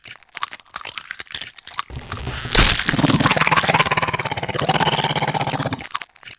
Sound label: egg; Cosmic Rage; The Experience-light; ships; Ratika